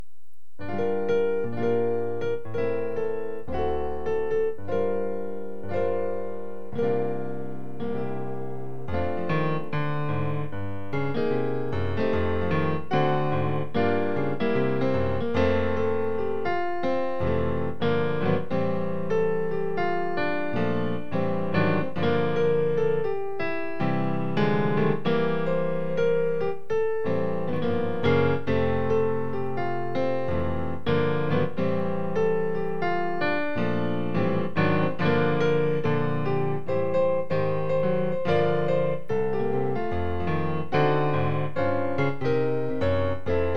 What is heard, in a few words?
Jingle Bells